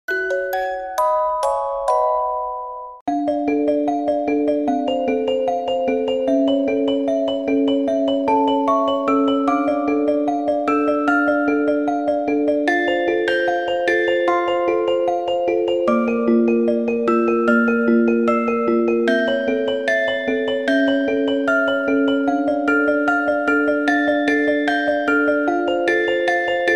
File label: Theme Music Box; Gravity Falls